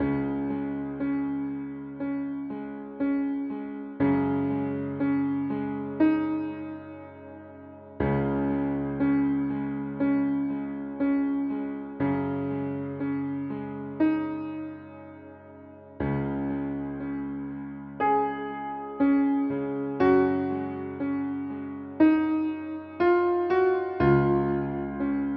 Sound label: loop